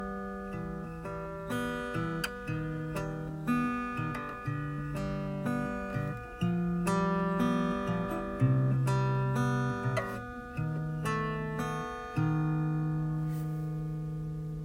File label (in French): guitare